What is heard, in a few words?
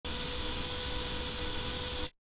robotic arm medium; soundscrate